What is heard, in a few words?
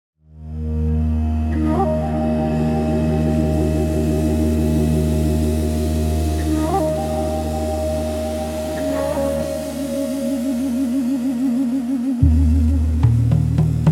lush ambient exploration soundtrack; emerald horizon; jungle-ambience